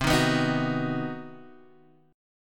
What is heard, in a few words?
C Minor Major 7th Flat 5th chord, Guitar Half Step Down